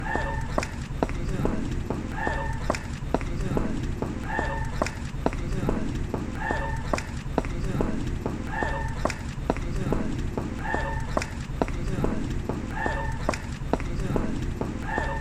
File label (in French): talons tempo